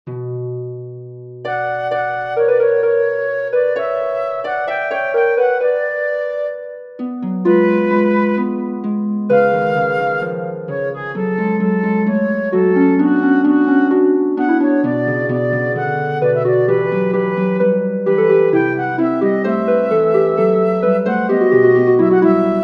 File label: recitative for flute harp; bach johann sebastian indessen seufzen wir